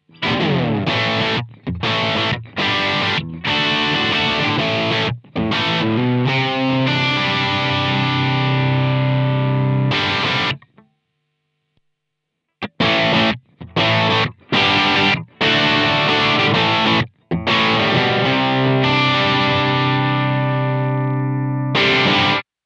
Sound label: Amp MR Stack Gain on 3 Stereo Out; Simlpifier